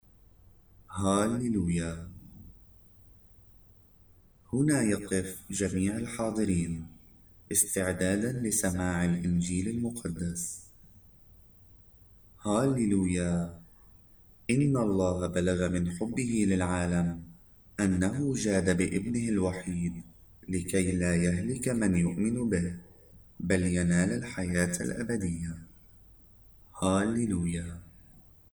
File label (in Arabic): هللويا